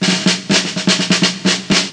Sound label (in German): Burg; Anno1602